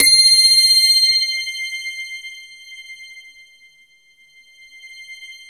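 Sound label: JV heaven guitar, JV-1080; Syntec; Wall of Sounds VOL-2; BASIC-JV1080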